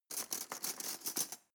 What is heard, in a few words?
cutlery teaspoon drawer handle